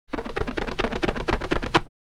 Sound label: Window squeaky clean wiping and screeching